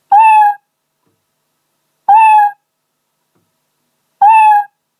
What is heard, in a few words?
cat sound effect meow